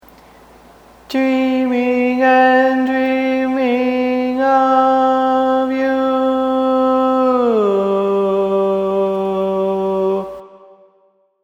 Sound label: Bari, Barbershop